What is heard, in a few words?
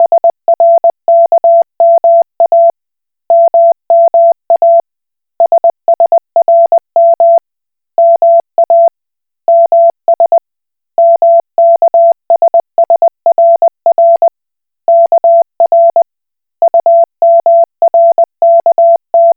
Koch Morse